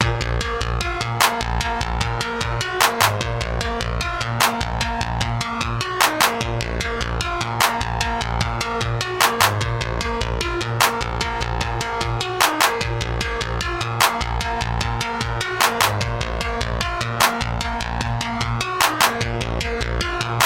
Flying Over The Top Acid

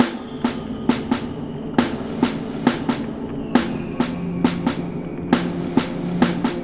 Military March Intro

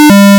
8 Bit Error

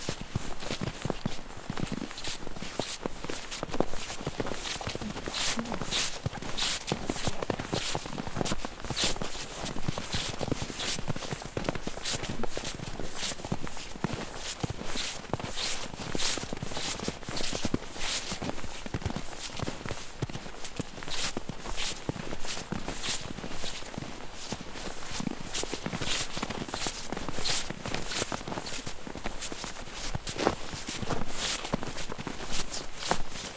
Foot Steps Snow

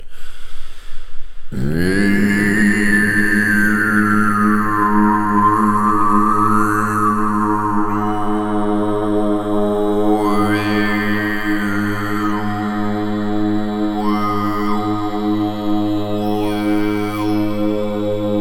Mid Mouth Chant Rev

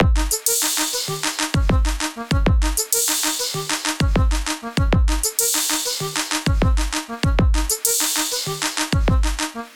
Jolly Cartoon Groove

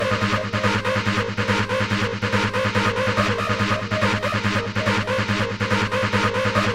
Beep Line

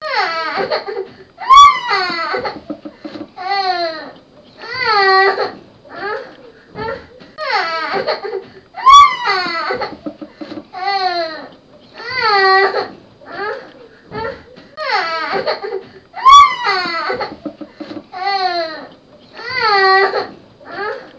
2 Yrs Old Boy Crying And Screaming Angry

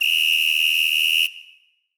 Whistle

